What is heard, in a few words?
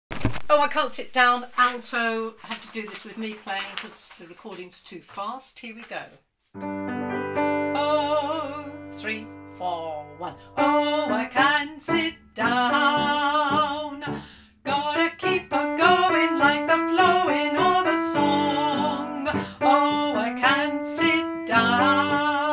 oh I cant alto